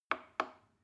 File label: plastic button small click soft slow